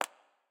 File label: shoe tap; Smite; Baron Samedi